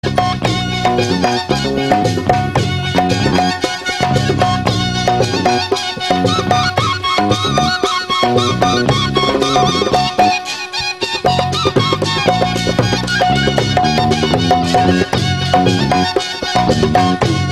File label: sindhi saaz